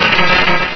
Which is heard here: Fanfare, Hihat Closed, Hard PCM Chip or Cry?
Cry